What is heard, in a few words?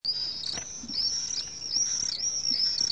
duett